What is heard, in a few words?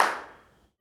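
Reverb in abandoned hospital; IRL Recorded; Reverb Enviroments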